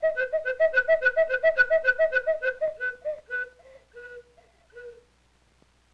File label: LoFi Cuckoo Series; Vintage Cartoon; Foley